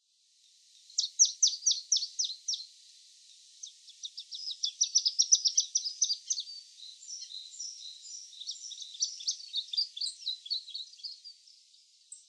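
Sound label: Dusky Warbler; mujisekka; biwako; koe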